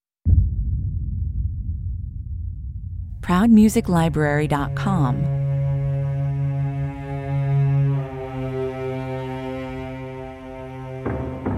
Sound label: tension atmosphere (without percussion) 75 bpm